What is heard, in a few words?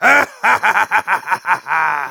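laugh evil, German; Demoman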